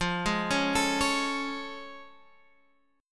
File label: A Augmented Major 7th F